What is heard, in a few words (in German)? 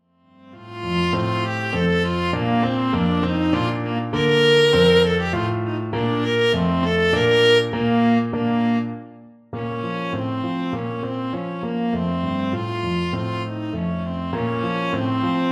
andante b dur